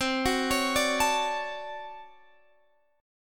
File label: D Major 7th, C; arpeggiated